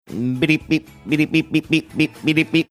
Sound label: making teletype sounds